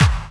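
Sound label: snare drum bass drum, Dance Mega Drums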